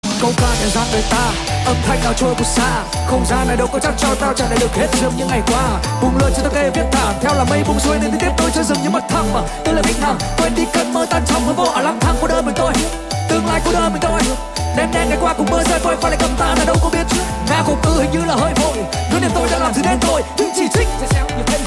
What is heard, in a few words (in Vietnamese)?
Nhac Chuong REMIX